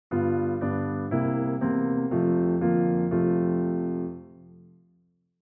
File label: Happy Hibiki Piano A